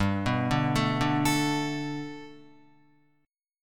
G Major Add 9th, Gadd9 chord, Guitar, 4F,4A,5C,5G,5C,6E; Gadd9 arpeggiated